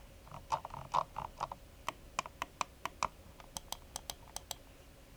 rad klick DPI

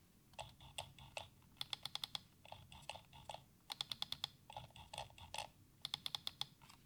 Scrollrad

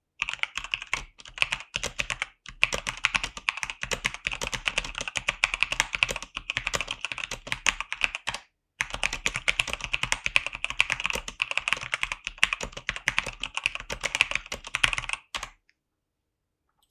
Schreiben

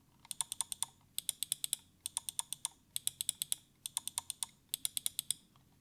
Links Rechts

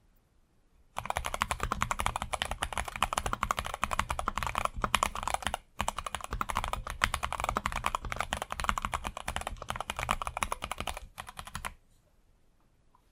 Normales Schreiben

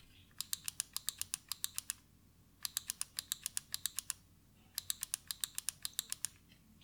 Schultertasten Vor Zurueck

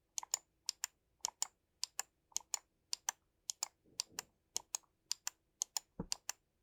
NZXT Lift Maustasten

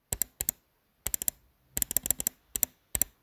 Mausklicks links

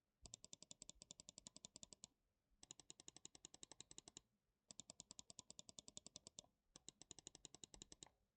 Links Rechts

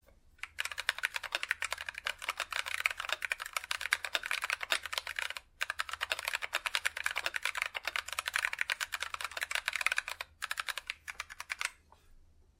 GMMK2 normales Schreiben

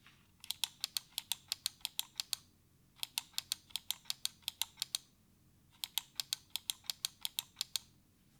vor zurueck

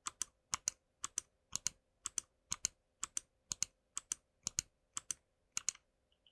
Maustasten

